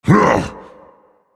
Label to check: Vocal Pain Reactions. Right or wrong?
Right